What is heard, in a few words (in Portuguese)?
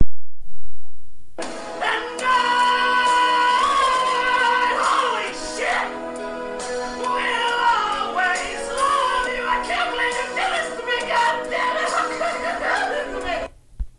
voz desafinada no chuveiro